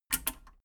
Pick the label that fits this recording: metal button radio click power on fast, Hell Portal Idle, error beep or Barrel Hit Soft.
metal button radio click power on fast